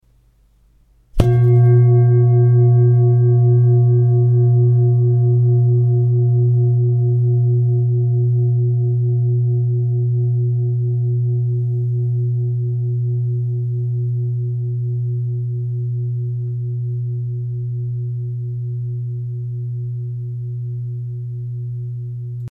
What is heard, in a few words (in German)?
Klangschale